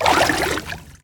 Deep Water Walk

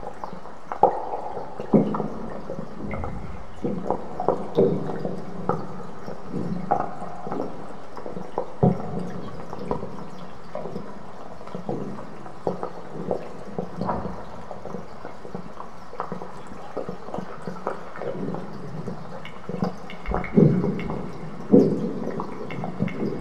Ice Cave Loop

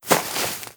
Grass Turn

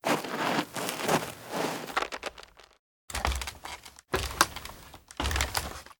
Tree Ladder On; moul

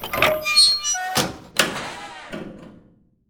Harvester Silo Gate Open

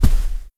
Rug Walk

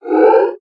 Place Blocker

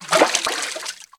Water Turn

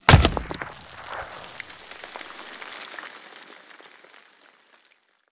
Vapor Miner Rock Hit

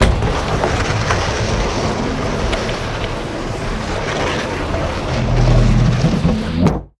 Door Close; moul

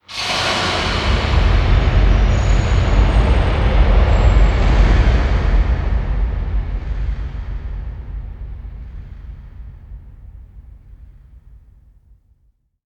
Pillar Reset Wet

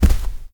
Rug Walk; moul

